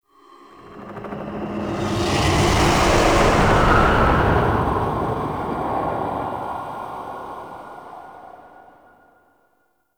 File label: Spectral Whoosh; Ambience; Warframe; Entrati